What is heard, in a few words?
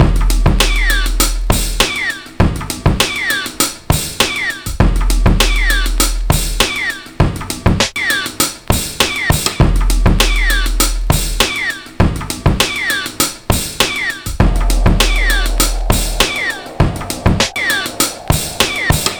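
drum, 100bpm, Breakbeat; ProSamples vol.40 - Breakbeat 2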